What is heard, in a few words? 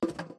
Characters Player Player Walk Metal Grate metal grate Walk